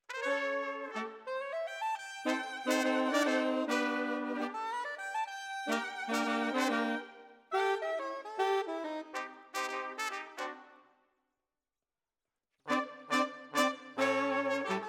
Sax

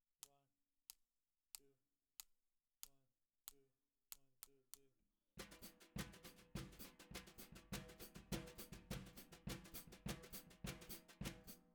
SNR

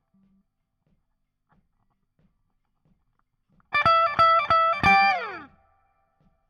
Guit Solo Back

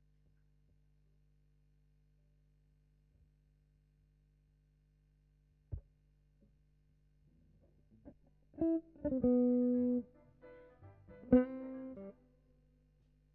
GTR B

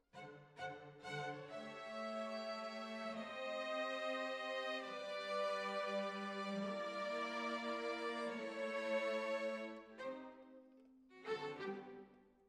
Violins, jazz for piano

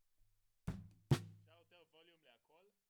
SNR Top

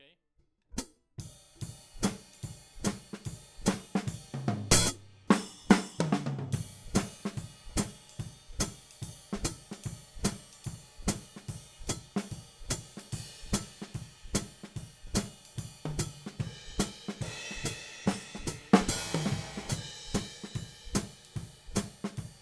Hat

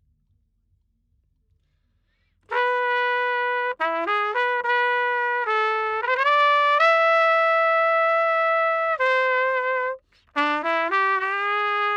Trumpet

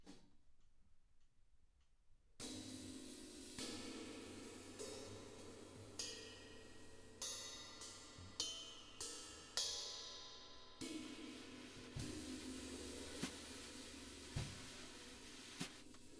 Drums

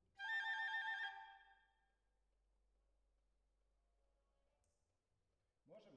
Violins Over

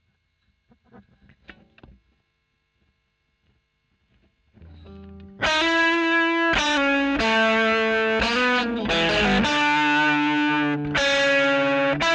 E. GTR Royer OD

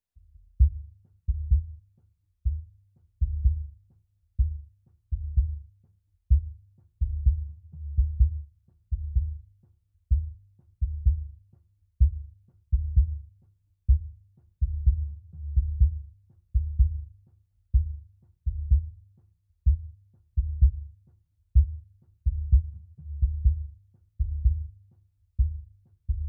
Sub

